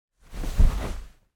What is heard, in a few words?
household chair sofa person sit down soft cushioned